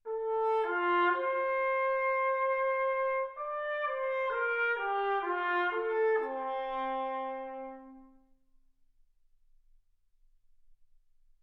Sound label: trumpet